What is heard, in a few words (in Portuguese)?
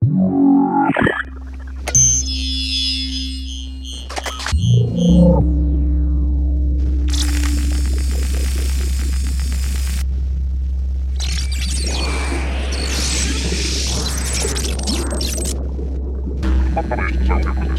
vinheta; Pure Data Basics Tutorial